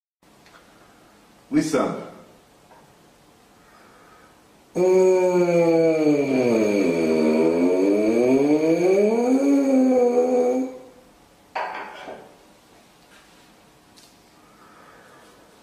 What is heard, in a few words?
Tuba Glissando